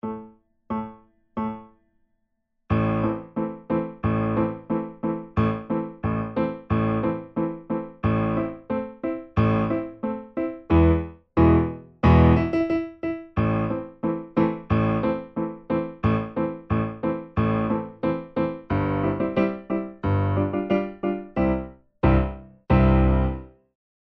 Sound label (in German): Secondo, Vierhaendige Uebungsstuecke; Diabelli